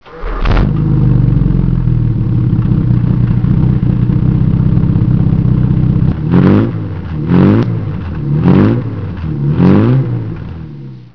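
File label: jag 340 throttle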